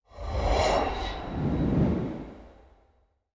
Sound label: dark magic